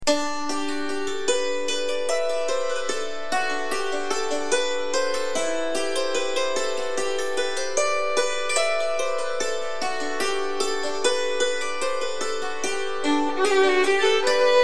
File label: Music Box